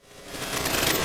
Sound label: Movements; 99Sounds; The Weird Side Samples